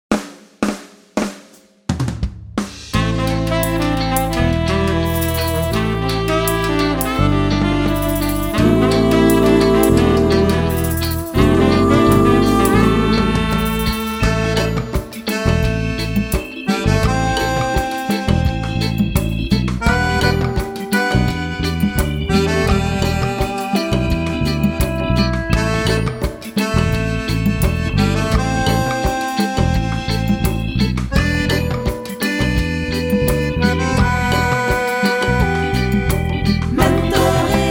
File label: MENTO REGGAE